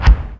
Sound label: Impact; Falcon 40